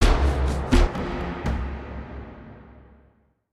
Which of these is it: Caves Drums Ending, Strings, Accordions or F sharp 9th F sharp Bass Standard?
Caves Drums Ending